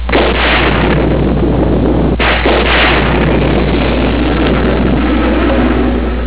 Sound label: Thunder